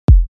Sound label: Kick 46Hz F; Road